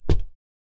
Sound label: fs wood, singlesteps